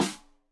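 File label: Snare; Deeply Sampled Drums; Stereo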